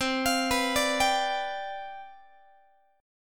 C Major 7th Suspended 2nd Suspended 4th, C, down, Guitar; arpeggiated; CM7sus2sus4